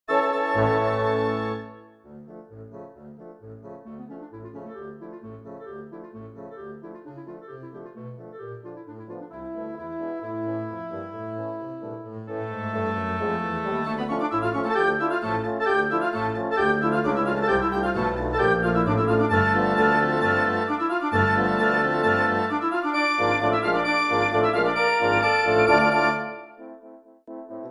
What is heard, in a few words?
Norwegian Dances